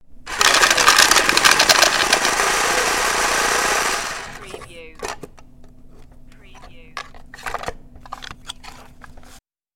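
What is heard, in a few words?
Card Shuffler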